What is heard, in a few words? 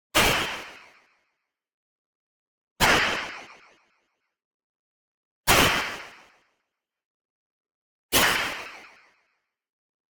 IMPACT WHITE NOISE Tonal Delay; boom library; Anime Essentials